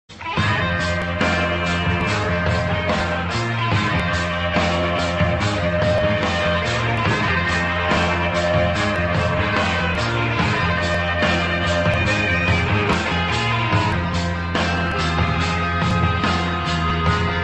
rock ringtone; iphone 7

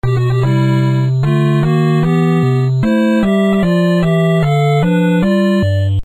iphone 6s princess ringtone

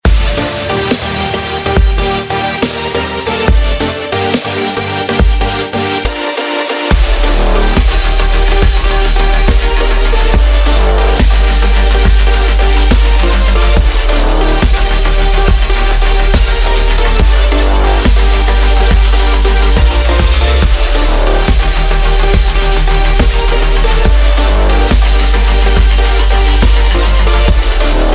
swedish house remix ringtone; i phone 4s

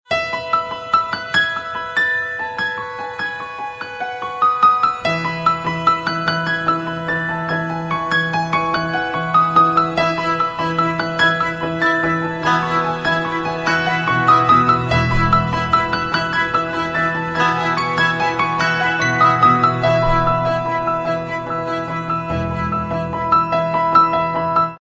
piano melody i phone 6 s ringtone

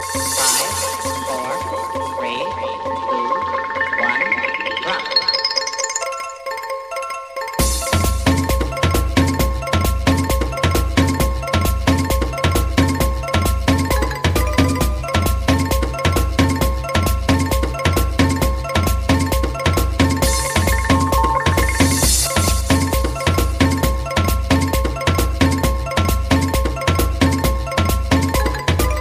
i phone se marimba ringtone

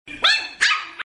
puppy barking ringtone

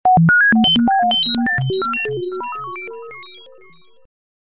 computer ringtone; iphone 7

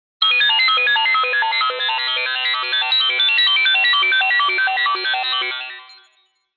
iphone x digital iphone ringtone

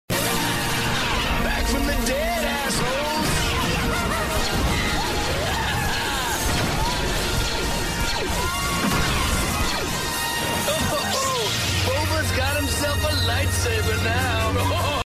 huawei y7 ringtone

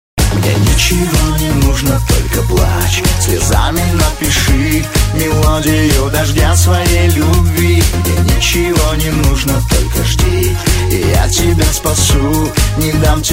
iphone 6 remix ringtone

